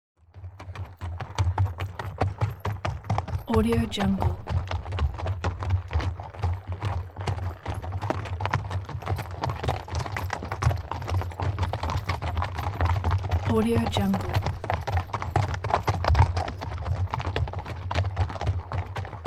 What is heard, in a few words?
horses walking in single file with boomy hooves; hollywoodedge